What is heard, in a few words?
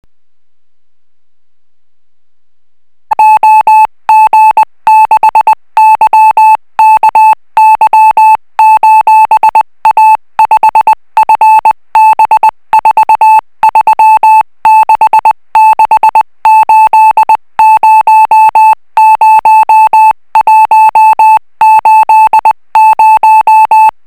Tenkoh Beacon